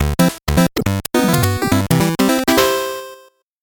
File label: 8bit jingle fast